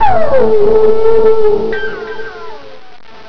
outro; ka kart race

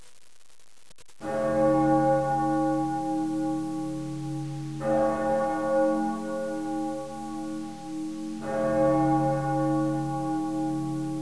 bells

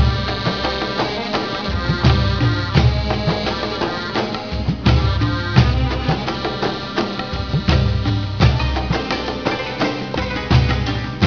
arab

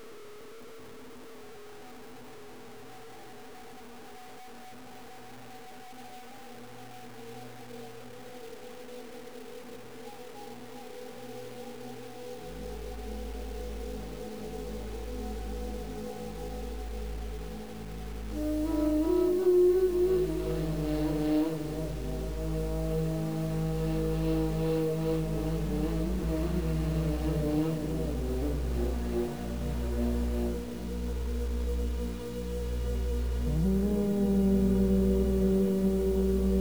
ambient winds

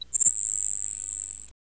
grasshopper

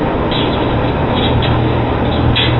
dryer; bust

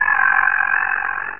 pain; mystic radar; mr zombie1

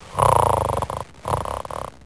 sleeping

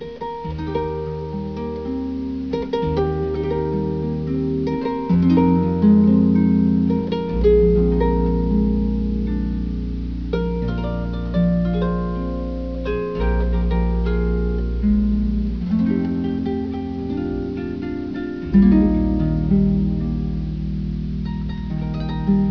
harp

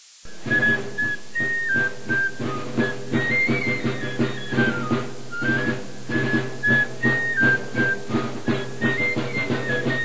celt; de scotland

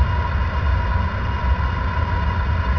low steam; dm achluophobia

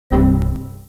chord

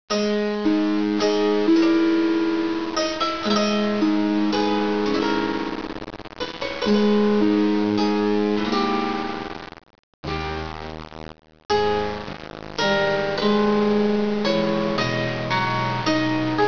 music

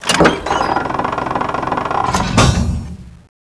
throw switch; dm clocktower

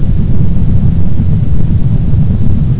door; borg

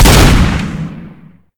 shotgun fire; deluge; weapons v3

